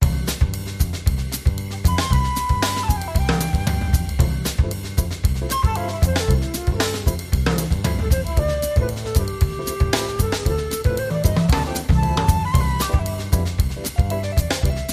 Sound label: Sixties Cocktail Lounge